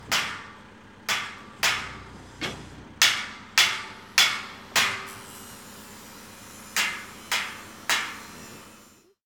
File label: Steel Hammering Outdoor